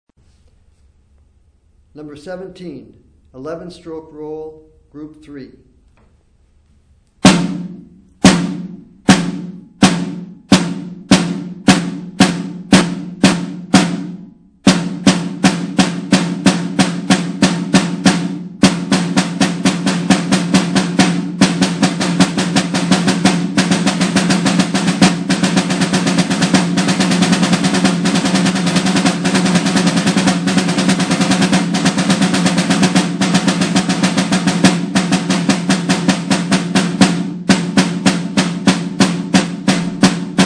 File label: snare rudiment